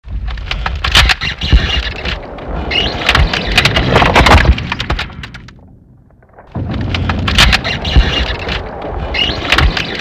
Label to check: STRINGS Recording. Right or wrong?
Wrong